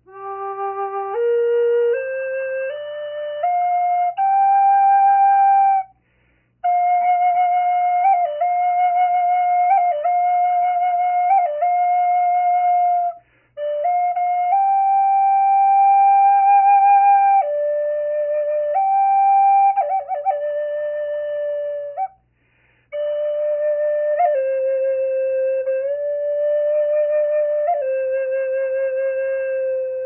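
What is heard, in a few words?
natural, flute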